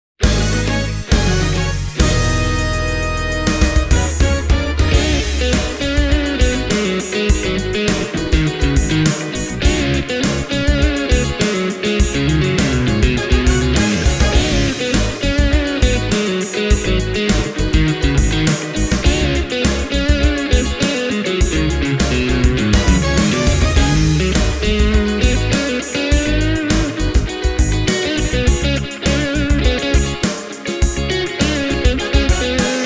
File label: esp ltd mm 30